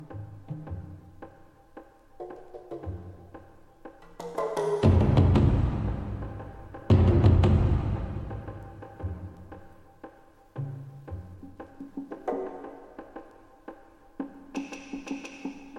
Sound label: Battle Drums